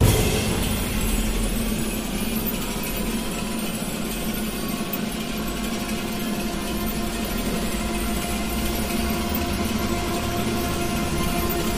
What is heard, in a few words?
near miss; Sheriff Of Nottingham